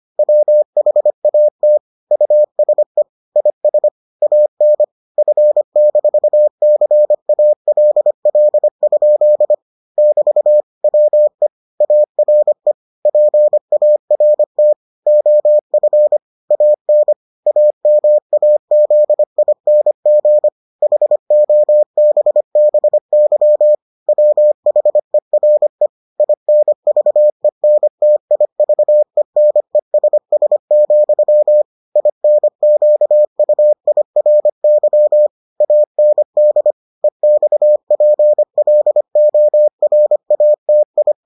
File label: morse